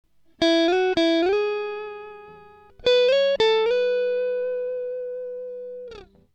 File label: slide